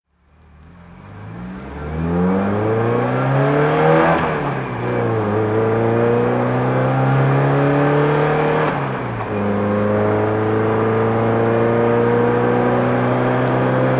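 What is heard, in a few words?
peugeot 504 rally drive, engine sound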